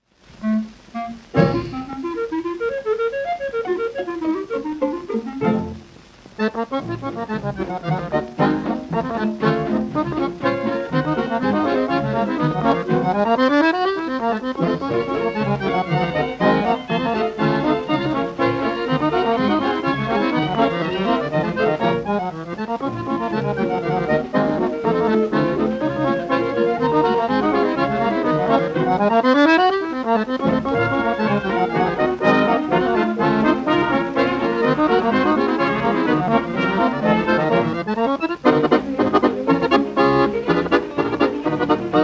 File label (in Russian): akkordeon; Motyl ki; pol ka